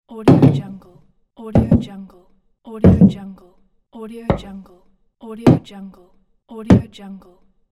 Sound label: put down wood; volkovsound